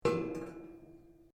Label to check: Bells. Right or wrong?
Wrong